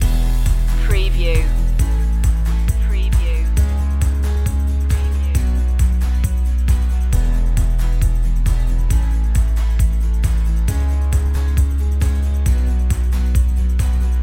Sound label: upbeat impact loop